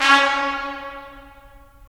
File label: BRS, Tps Stacc; Roland - LA Composer vol. 4